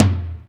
High Floor Tom Drum Sound F Key, Royality free tom one shot tuned to the F note; tom drum samples